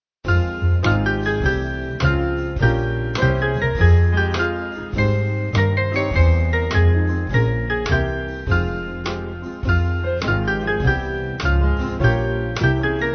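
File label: Faster, Band, Music Backing; 3/Fm Faster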